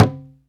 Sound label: bata; AKAI S-Series CD-ROM Sound Library VOL-5